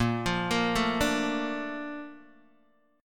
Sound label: Bb Major 7th, Bb, down, Guitar, DADGAD